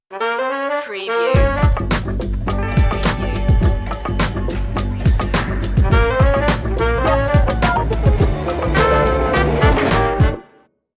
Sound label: comical detective stinger